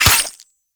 Melee Dagger Impact Flesh; Weapons; Impacts; Daggers

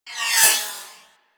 Swarm Cannon Projectile; Warframe; Weapons; Corpus

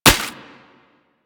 Primary Initial Mech, Weapons; Sapient

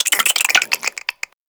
Infested Minion Attack, Vocals; Warframe

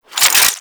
Spear Gun Ready, Weapons; Warframe; Corpus

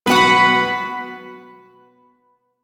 Shawzin Chromatic Chord; Warframe; Duviri Shawzin

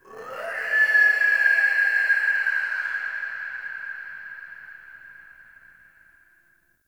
Day Screechy Creature; Ambience; One Offs; Glades Of Andurin

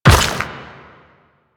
Step Dirt, Footsteps; Warframe; Quests; The New War; Kahl

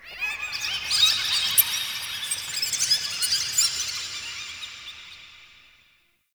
Root Cave Small Critter Squeaks; Ambience; One Offs; Neathuns Root Cave

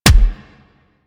Weapon Punch Rifle

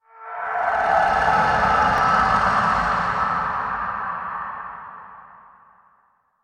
Distant Howl; Warframe; Sacrifice quest; Umbra

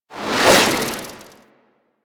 grenade throw whoosh; Enemies; Warframe; Infested; ZealoidPrelate

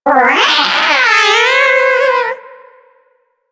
Kavat Vasca Lure Call, Hunting Critters; Warframe

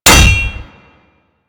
Shield Impact; Warframe; Grineer

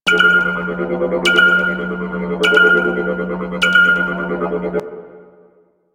Crp Ship Radar Machine Pulse Loop; Ambience; Props; Warframe; Corpus Ship Remaster

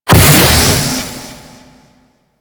Space Pod Thruster Burst; Grineer; Vehicles; Warframe

